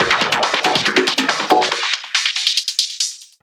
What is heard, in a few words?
Fill 140 BPM; Loops; vengeance essential club sounds-3; VEC3 Fills